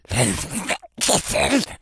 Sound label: amb; cstrike